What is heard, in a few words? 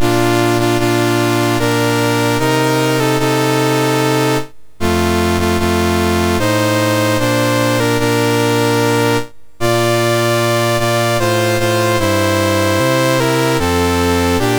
MOS6581R3, National Anthems; Switzerland